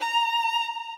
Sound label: solo